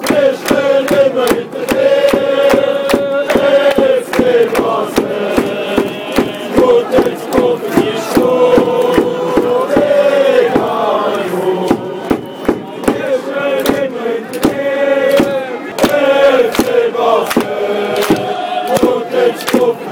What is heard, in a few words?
chant, fan chants; fc basel